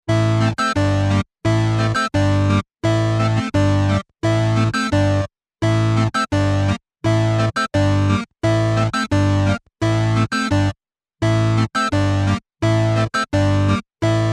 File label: Game Faster Walz Loop; 8 bit Gaming Musik; Tempo: schnell; retro gaming